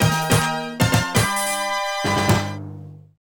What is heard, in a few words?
Victory Decision; Exvania; Namco NA-1; arcade